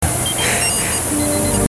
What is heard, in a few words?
Beeps